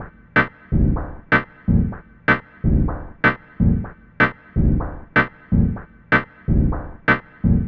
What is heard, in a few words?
Tech Stab 125